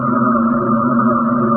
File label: hovercraft brake; There; ThereClient